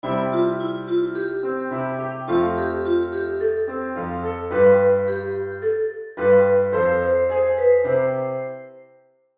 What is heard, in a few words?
melody